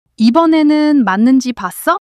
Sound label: surprise